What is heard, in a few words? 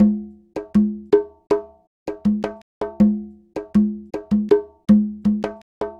Conga, Latin Percussion